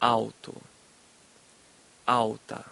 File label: Alto